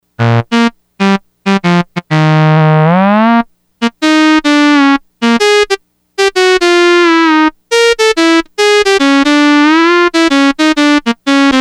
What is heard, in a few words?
Mono Sax, Yamaha DX100; Factory Patches